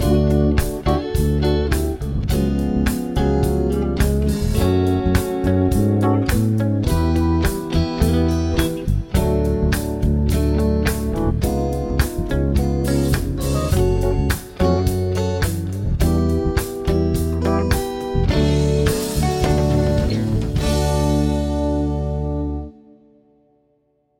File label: progs with applied chords